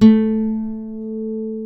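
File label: A2 FLAMENCO, FLAMENCO guitar; WorldSounds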